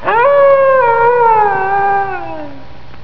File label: howl; quake2; chaos